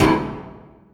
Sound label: Oneshot; Sonic Foundry (Sony Creative Software); Esoterik Beatz; Spitter